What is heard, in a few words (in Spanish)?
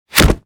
GOLPE CUERPO